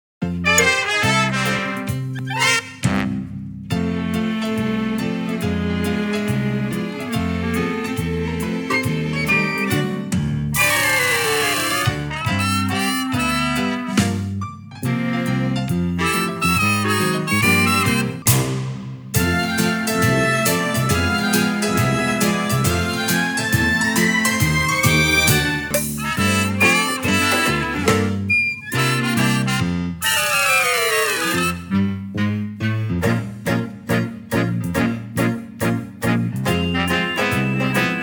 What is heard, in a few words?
backing track